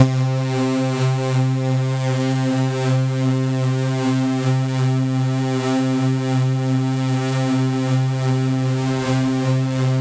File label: C1, Synth Line; 90 s Sample CDs; NiceBeats; SynthLine VOL-14